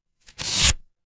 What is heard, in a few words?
paper fabric tear